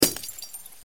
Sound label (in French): Verre